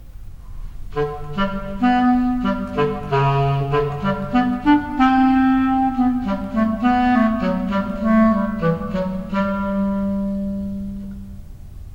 Cat Clarinet

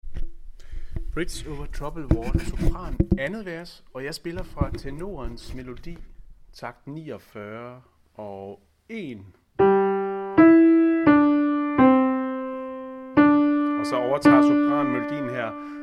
sopran

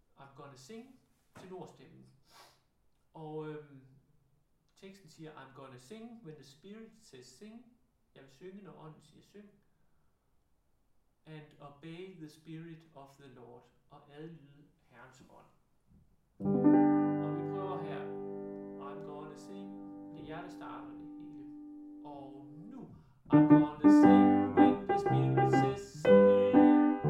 tenor; I'm gonna sing; harlev koret

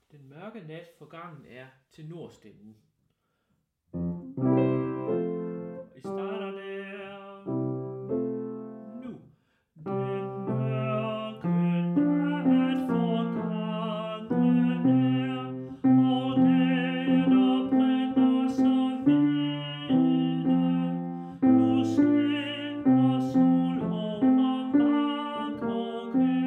tenor; Den mørke nat forgangen er; harlev koret

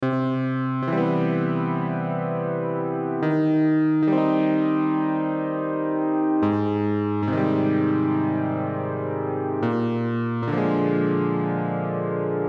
elevator jammed; DOORS but Cheap

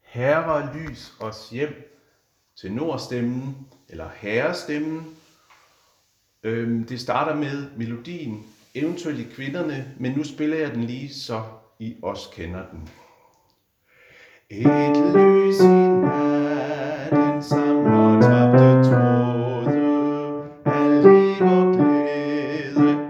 tenor; harlev koret; Herre lys os hjem

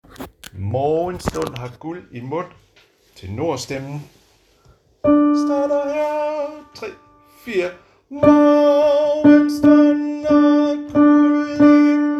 tenor; harlev koret; Morgenstund har guld i mund; Weyse

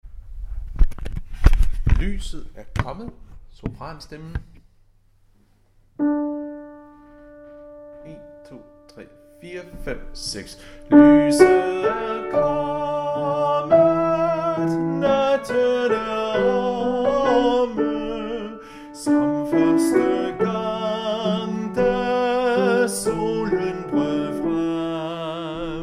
sopran; Lyset er kommet

